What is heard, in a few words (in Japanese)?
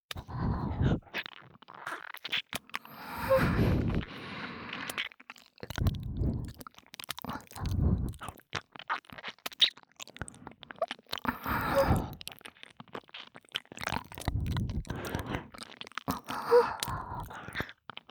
(右)吐息たっぷりまったり耳舐めエッチ, 黒耳 パンパン系効果音版; 舐め音比べ